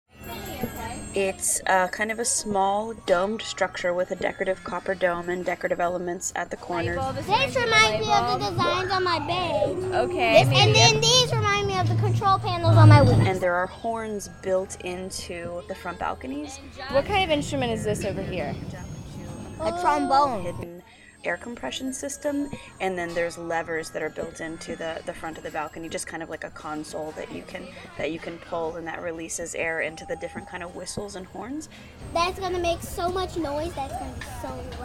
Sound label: Music Box